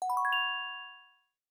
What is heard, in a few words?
swing up start music box; LG Wing 5G Verizon